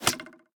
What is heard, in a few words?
roman ranged bow impact; smite; npc; roman archer